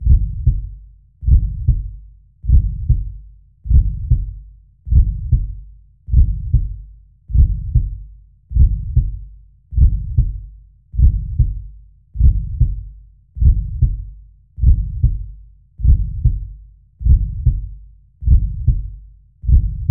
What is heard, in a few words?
Timbre Looped Ringing Transient